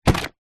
household vacuum cleaner dyson v7 stick set down carpet